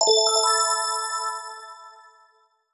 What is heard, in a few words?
multiplier level up; netent